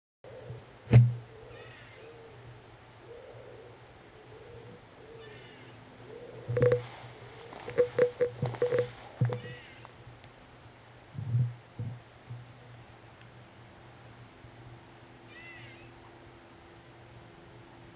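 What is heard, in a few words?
honey buzzard juv chicken call; hexhamshire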